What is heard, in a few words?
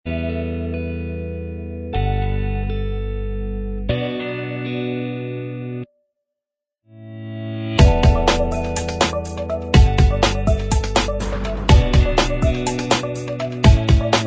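instrumental, backing track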